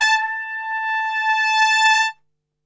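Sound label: A 2 trumpet; Best Service; Brass Super Section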